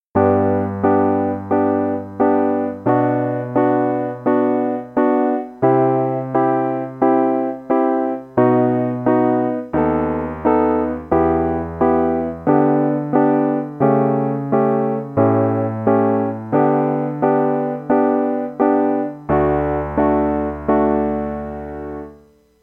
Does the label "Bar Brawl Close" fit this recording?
No